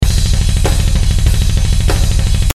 double bass, rock; ringtones

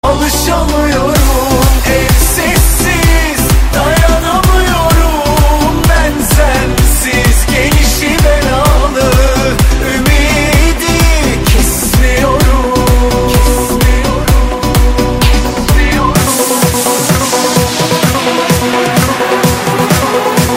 sax

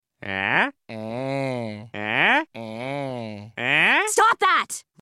Ehh

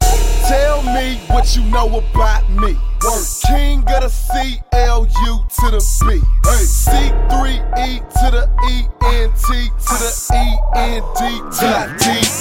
Remix

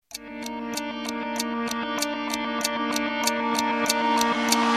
Clock Strikes Intro

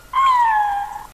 Mews Cry

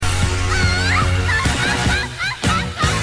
Laugh; Shimoneta

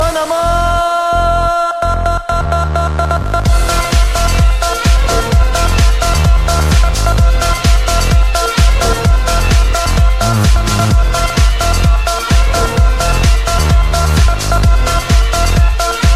Arabic Bass

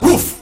bear woof; animals

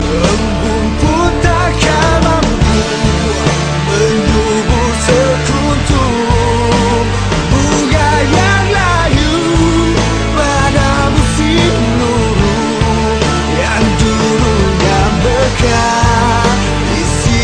chorus; rnb

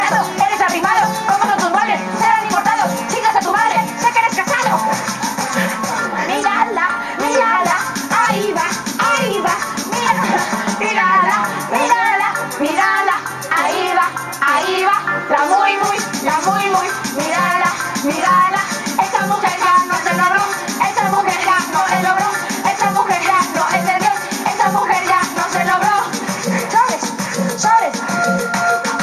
tribal

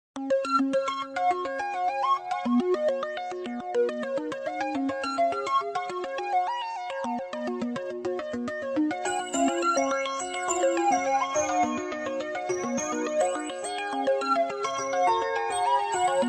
blackberry mms

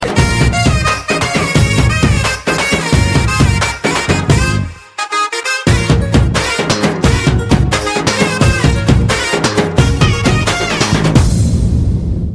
tabala and flute; classical

